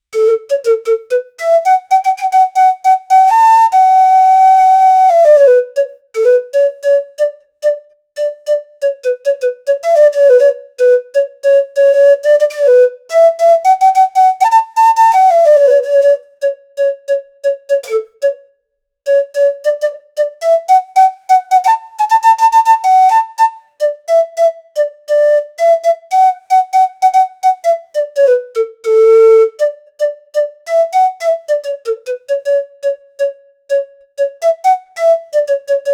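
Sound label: panda finding game winning, guzheng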